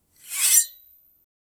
soft scrape of metal agai; sheath

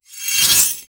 merge army magic cast spe

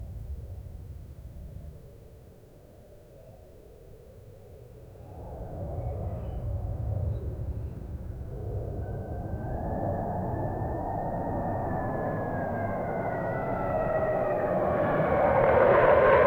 a plane tail blast; disasters

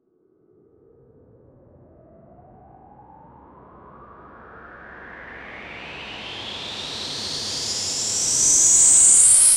smooth riser for videos, transition

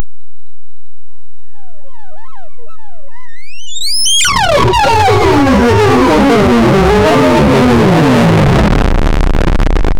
helicopter

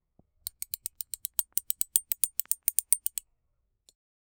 belt buckling

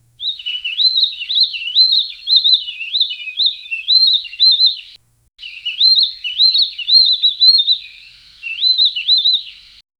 the clear high pitched ch, bird

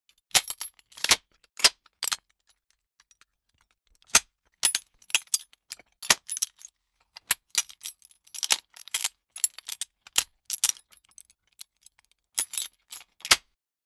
ak-47

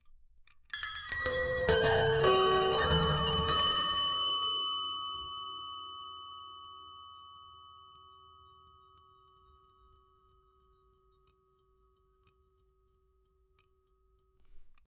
clock chimes; timekeeping

